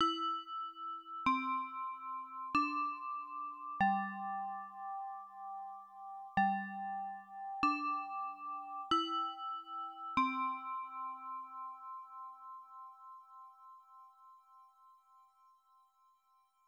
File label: Chime; doukyusei 2 pc 9801